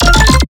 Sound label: Melodic Bass F 150, One-Shots Bass Hits; Ghosthack; Color Bass Essentials